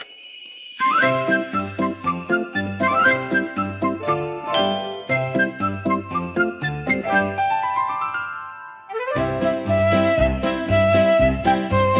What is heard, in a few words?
Christmas kids